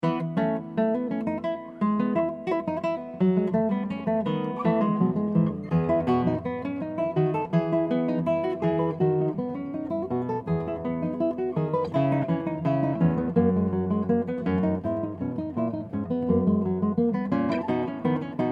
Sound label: Lute Suite No. 4 in E Major